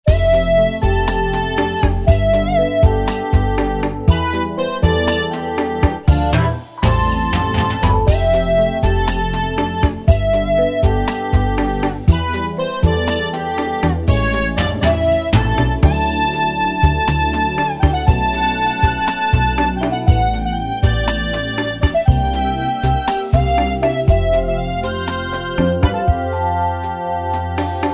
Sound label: Soprano Sax